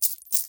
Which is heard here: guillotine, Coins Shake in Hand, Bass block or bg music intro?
Coins Shake in Hand